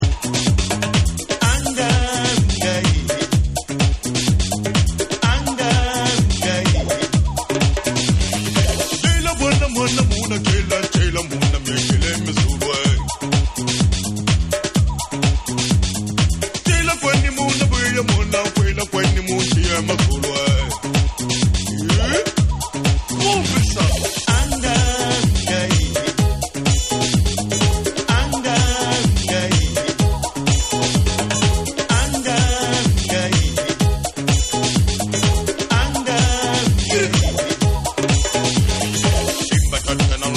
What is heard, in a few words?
AFRICAN, Vocal Mix